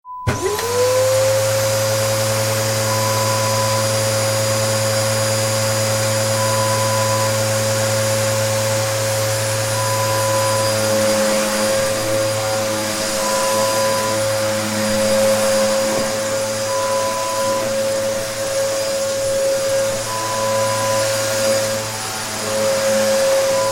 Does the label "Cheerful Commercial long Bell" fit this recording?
No